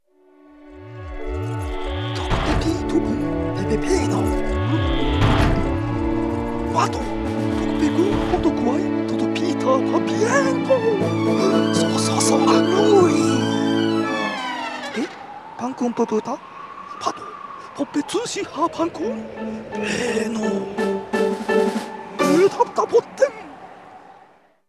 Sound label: Goal Completion Cinema; Pikmin 2; 10,000 Pokos Obtained Movie; GCN